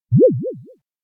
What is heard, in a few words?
elec boyon excite; anemoi